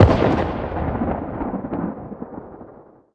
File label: shock distant, lightning